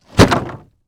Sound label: Impact Wood Plywood, Drop, On, Plywood; Materials